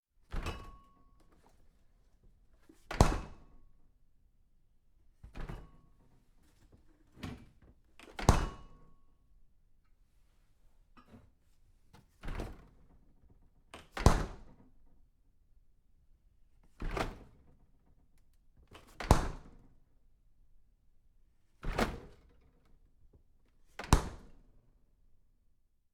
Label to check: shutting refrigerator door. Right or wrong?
Right